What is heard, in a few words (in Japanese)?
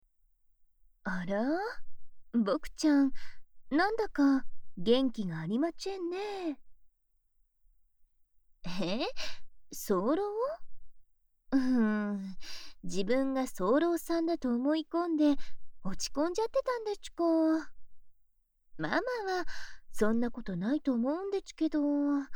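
耳舐め（右耳）, ASMR; 早漏訓練; 癒らしママの耳舐め早漏訓練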